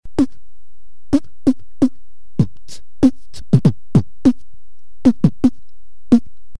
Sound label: snare